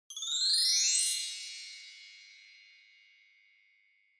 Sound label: Wind Chimes Gentle Up Wide